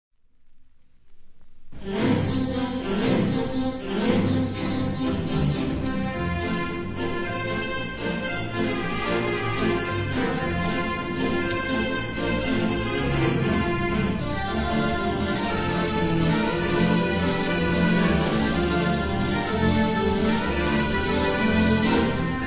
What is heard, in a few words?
toytrumpet, theatre organ